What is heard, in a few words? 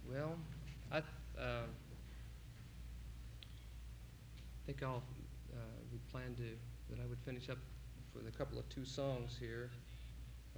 Applause